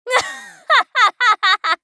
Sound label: laugh; Eternal Return; Hisui; JPN